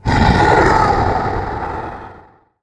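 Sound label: demon ambient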